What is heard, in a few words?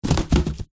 present wrapped box fall over; foley; zapsplat